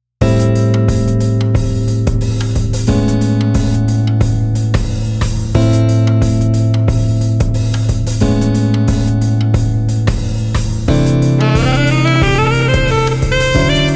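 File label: Street Guitar Sax Drums Jazz, 90 bpm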